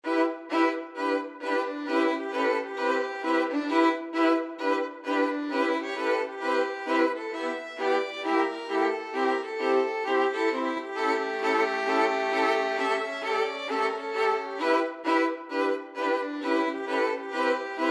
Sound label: violin ensemble